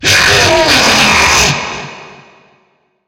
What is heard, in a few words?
jumpscare sound; five nights at dolmas 2